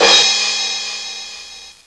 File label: High Score; Droids 1.0